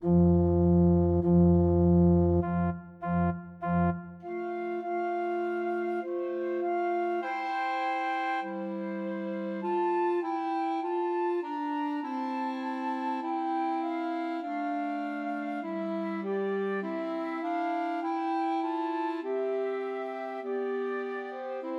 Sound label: O Come All Ye Faithful Christmas Trios for Flute Clarinet and Alto Saxophone